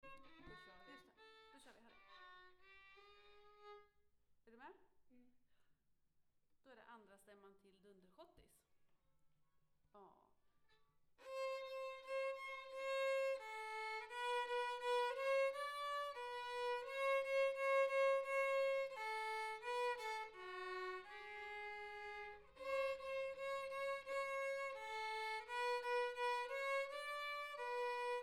Dunderschottis stämma

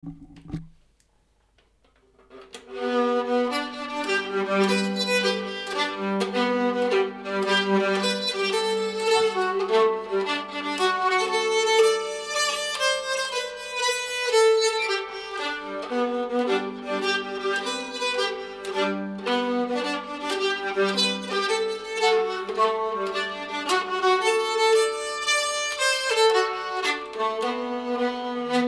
stämma; Inteinte

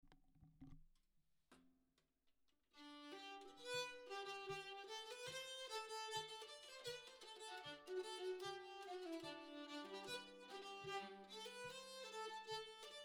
schottis; Ottars

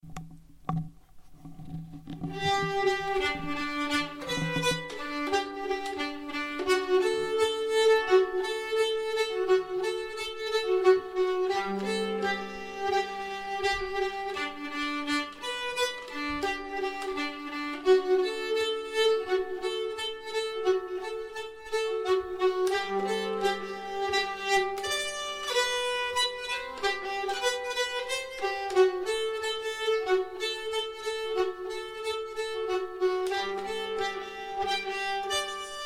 polkan stämma; larpolkan

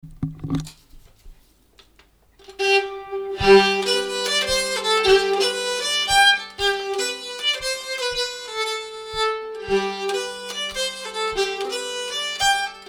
Polska; A.G.Jernberg; Pittlåten

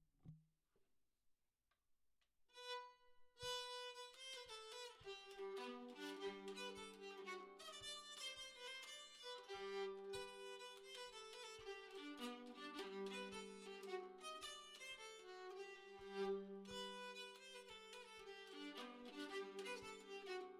Polska; P.P.Menlös

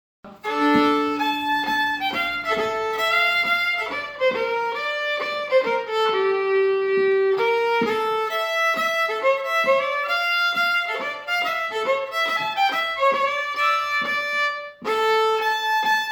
schottis

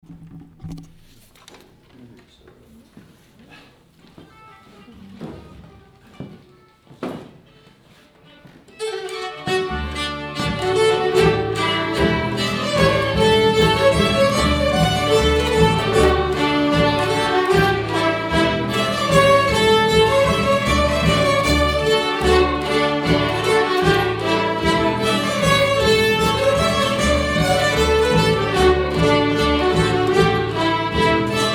schottis med gruppen; Thores schottis

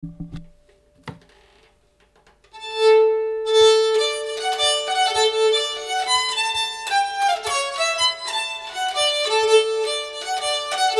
jamtpolska

